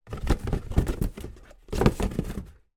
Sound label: foley cardboard box movement shelf; zapsplat